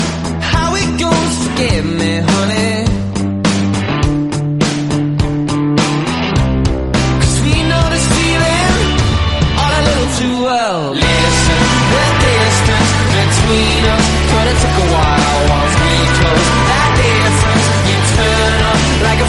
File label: classical fast musical song tone ringtone